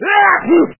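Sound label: male loud sneeze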